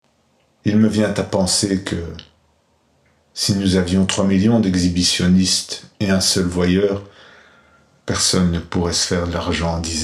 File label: vocal